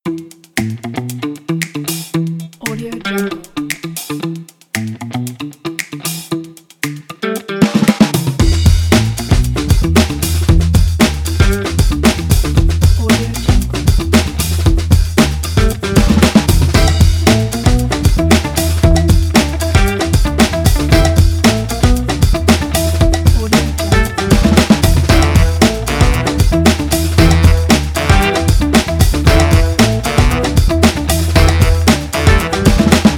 for upbeat funk pack

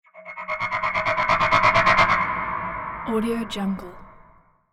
bloody nightmare zenith stingers; soundmorph

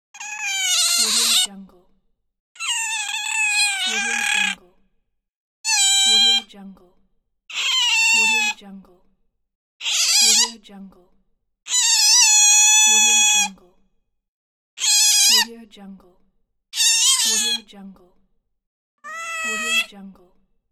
ferret

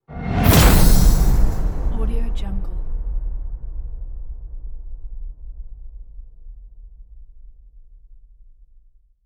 cinematic hit; volkovsound

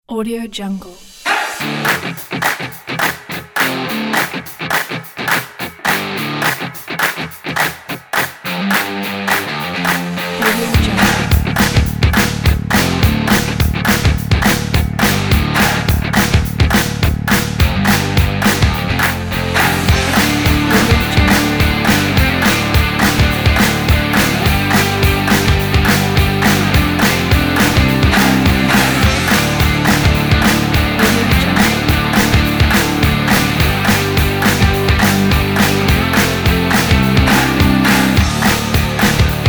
upbeat energetic indie rock

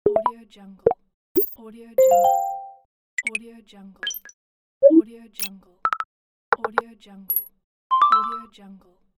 interface sound effect pack

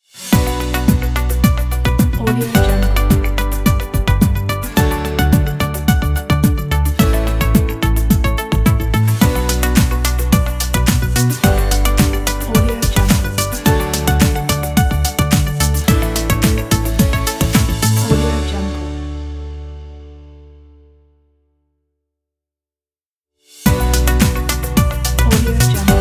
motivational logo; catthesongs

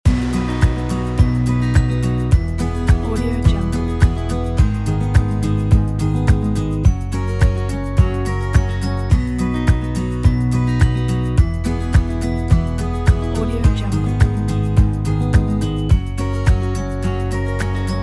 acoustic loop

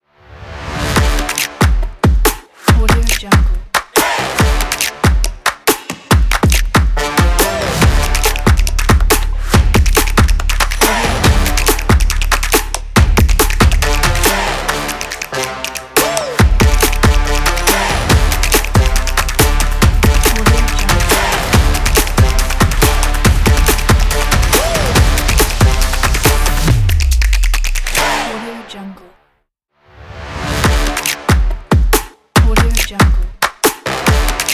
stomp claps promo logo; puremusic

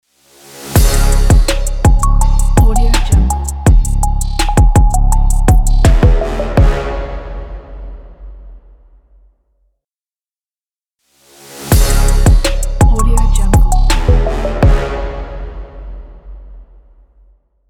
the trap beat logo; studioproject

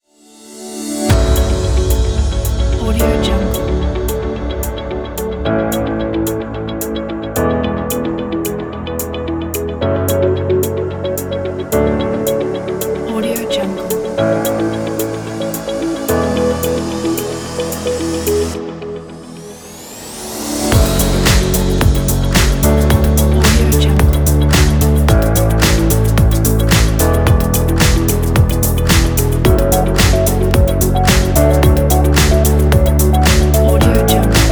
upbeat business corporate

